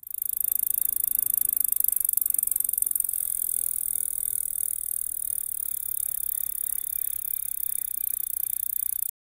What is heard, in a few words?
bike sound effect